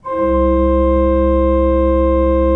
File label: SWL C1, Cathedral Organ, SWELL WERK; Propeller Island